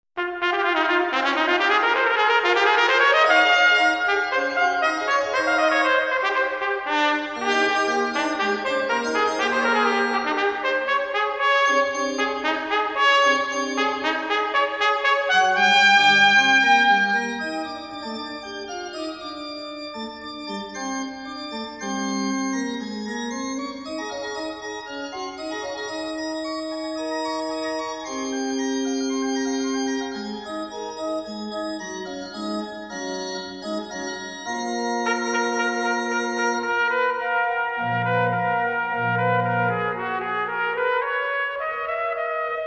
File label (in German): trompete u orgel; karlson